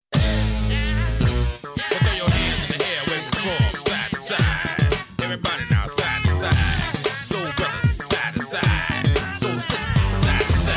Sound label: Funky